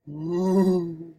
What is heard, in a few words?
ghost ooo; jibo